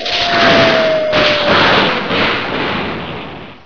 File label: turret die; source engine; hl1; video games